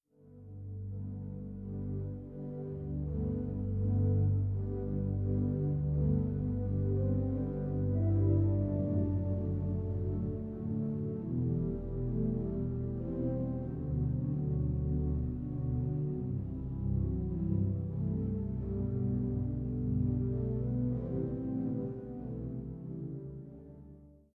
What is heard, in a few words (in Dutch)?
Orgelsolo